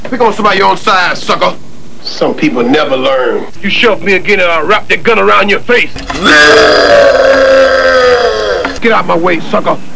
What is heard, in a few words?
chain